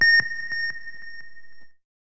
ping; SGI O2 Out of Box Experience 2.2; cyber astronomy; Voyager

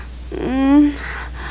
MOAN

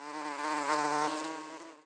embalm; PHARAOH

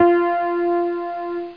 Piano F#; oslo; studio

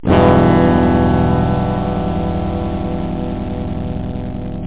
guitar; OctaMED SoundStudio V1

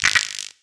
scarab hit; DAoC

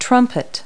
trumpet; Multi-Lingual Talking Picture Dictionary

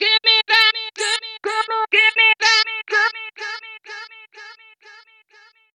VOICE GRL HOUSE 125 A, House Voice

